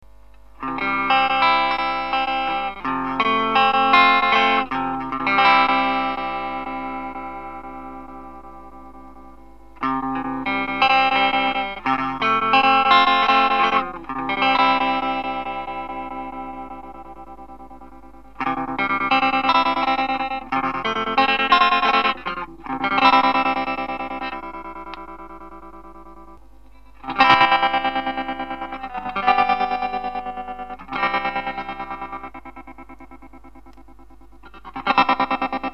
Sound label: PULSAR, tremolo; MXR